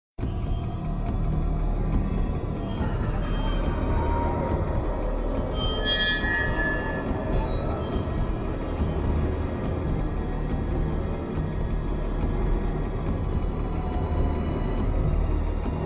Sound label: Ambient; Secret Laboratory